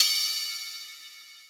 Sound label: Cymbal Acoustic; One Shots; Drums; Ableton Live 12 Lite; Core Library